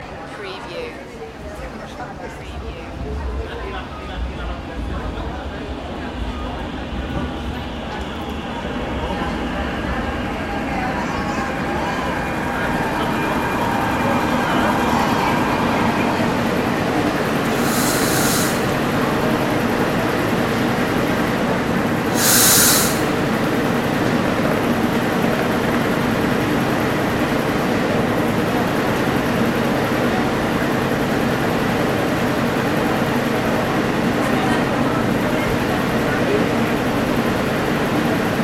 barcelona metro train loop

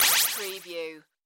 Laser Shot Delay Sci Fi; Sound Effects Bible

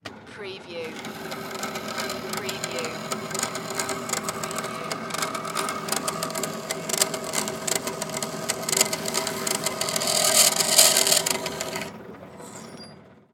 Band Saw Cut Grind; sound effects bible

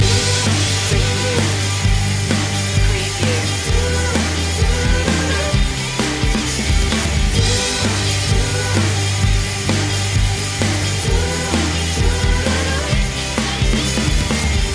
break out loop

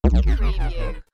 Digital Downwards Deflate

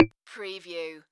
Multimedia Percussion

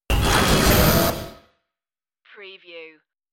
space lift door

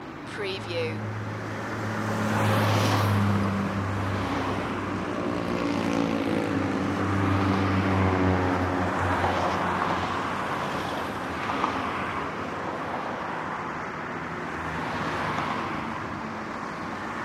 heavy dirty traffic loop

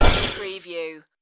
spaceship door close

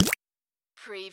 CARTOON DRIP

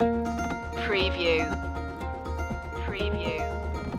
hard loop

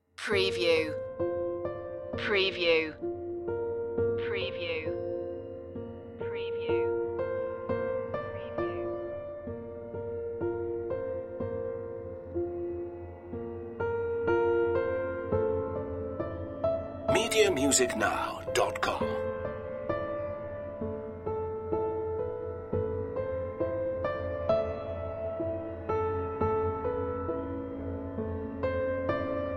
solo piano

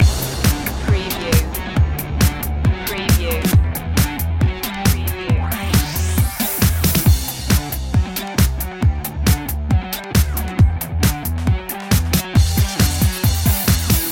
digital kutz loop

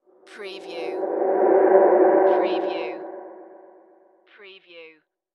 Ghost Approach Pass By; sound-effects-bible

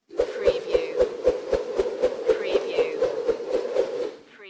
Lasso Continuous rope swishing

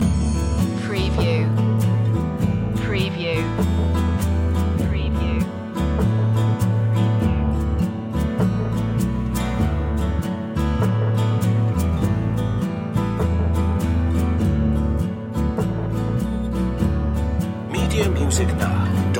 hawaiian lullaby loop

